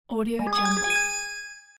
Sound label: achievement